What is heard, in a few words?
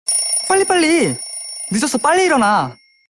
Alarm